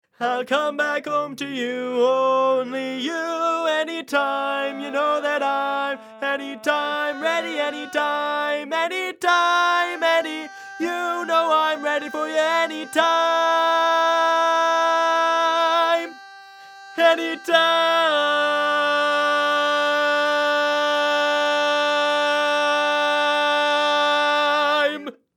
Bari, barbershop tags

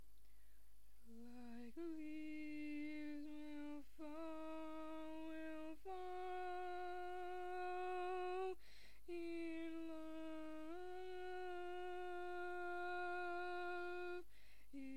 Tenor, barbershop tags

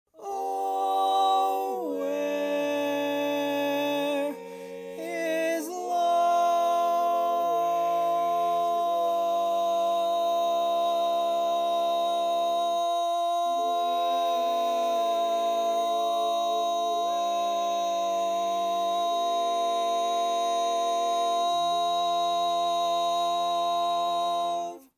Lead

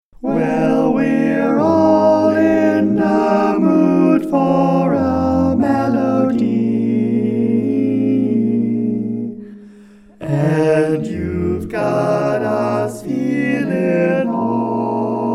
All Parts, Barbershop Tags

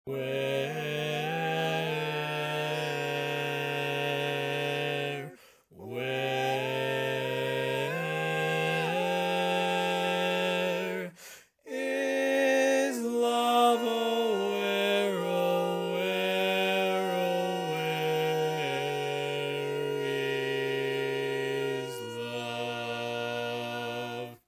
Bass, barbershop

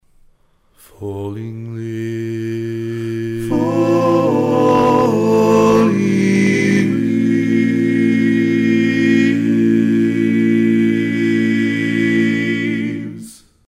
Tenor, barbershop tags

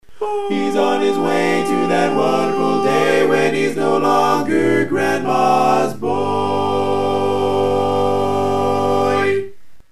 Tenor, barbershop tags

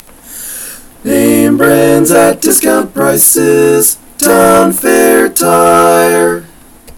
All Parts, barbershop

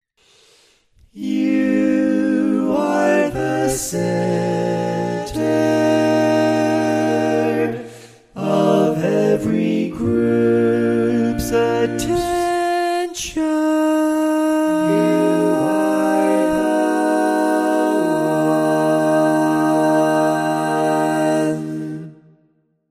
Lead, barbershop tag

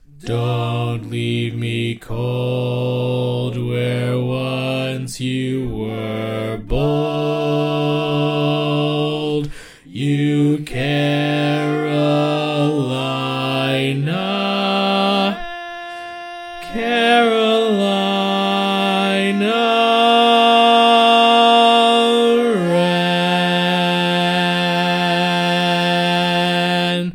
Bass

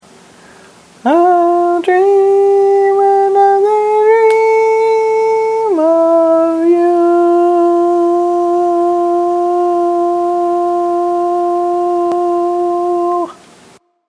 Tenor, barbershop; Arranger / Burt Szabo

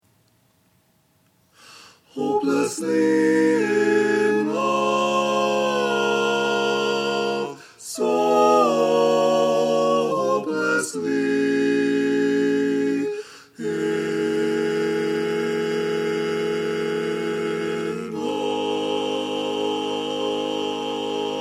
Bass, barbershop

